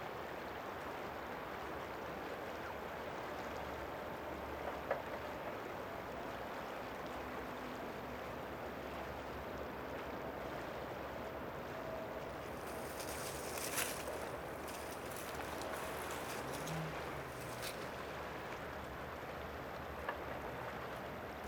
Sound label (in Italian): suoni lungadige; borgo sacco